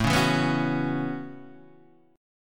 A 6th Add 9th A down Guitar Half Step Down

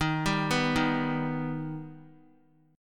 Eb Augmented, Guitar; Eb+ arpeggiated; x,6,5,4,3,x; JGuitar

